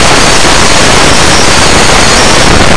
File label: gear